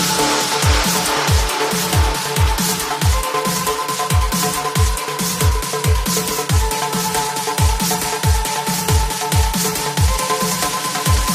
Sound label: unbeat evo ringtone